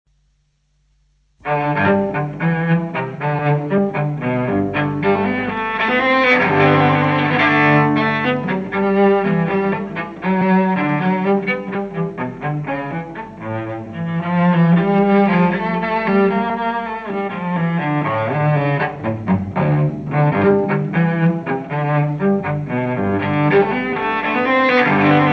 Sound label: Cello Suite In G Gigue; Bach; BWV 1007